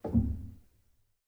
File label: rel, Grand Piano; bitKlavier Grand; Piano Bar